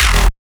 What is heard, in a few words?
E Bass Hit; neuro; BIG5AUDIO